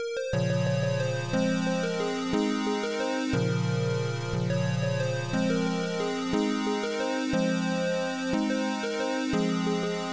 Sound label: synth